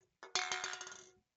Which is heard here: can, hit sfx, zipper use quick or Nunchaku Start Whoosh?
can